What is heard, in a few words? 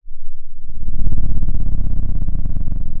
pop, Sound of an engine revving